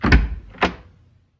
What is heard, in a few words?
Door Open Wood; The 39 Steps